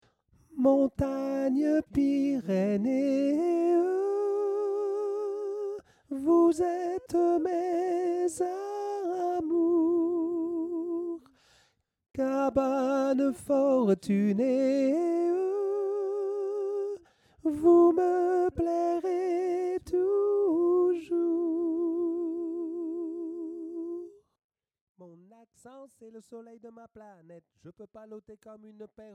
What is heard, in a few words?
alto; Alti